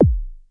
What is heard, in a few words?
stomp bass, Roland SH09, drum machines